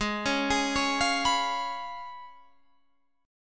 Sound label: Db 7th Ab, guitar